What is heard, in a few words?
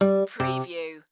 Casino Electronic Sound Two Tone